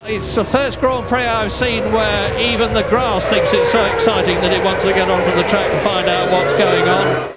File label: grass